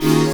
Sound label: phoom; Truth-Hz - 1-Hit KO Sample Pack